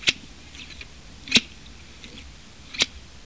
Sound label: scissors